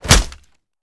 punch gun player; gmod; arccw uc commons attachments

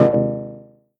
ui denied; gmod; ncs shared resources

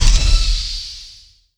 sit down; gmod; kingpommes; starwars; atrt

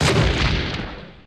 stomp light; gmod; lvs star wars; atte

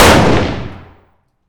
fire dist 308 rif int; gmod; arccw uc commons attachments

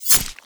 knife slash; tfa starwars melee weapons pack

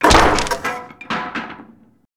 board damage broken; hammer; melee; tfa starwars melee weapons pack

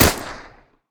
fire; gmod; arccw uc commons attachments

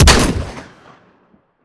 shotgun lesslethal; gmod; arccw uc commons attachments

